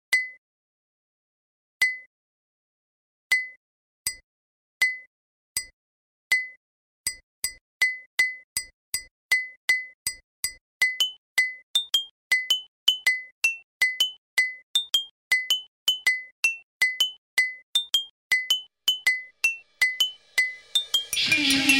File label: Ding Ding